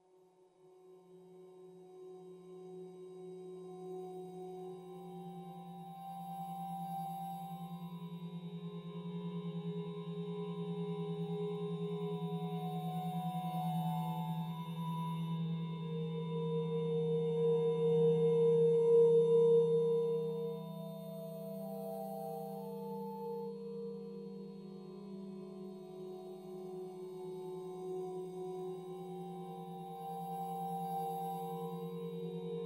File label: chanting waves; enough records